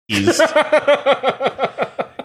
laughing

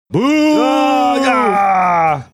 booaaaahgah

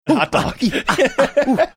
making handling hot food sounds

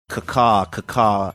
cacaw cacaw

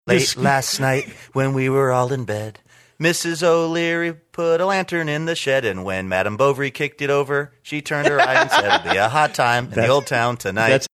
singing late last night when we were all in bed

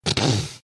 snorting

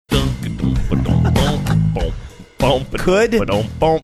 singing along funky bass

